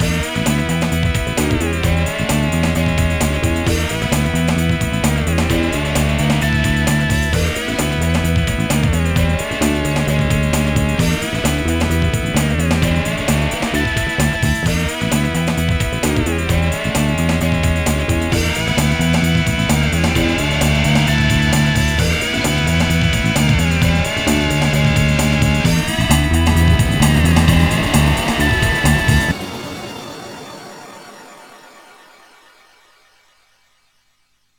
Bass Grooves; FEVER; USB Soundscan vol.30; AKAI

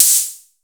HAT O; Crypton - DrumBase5000

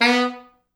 B2, Pop Brass; Best Service ProSamples vol.19; AKAI

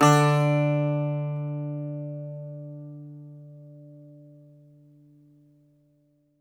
Greek Bazouki, plucked strings

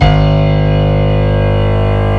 M1 piano

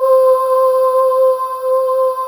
ANGE AILE C5, Choir; USB Soundscan vol.28 - Choir Acoustic & Synth; AKAI; ANGEAILES

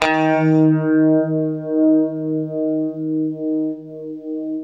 UNIVIB D#2, Guitar; East West; Steve Stevens Guitar Samples Collection

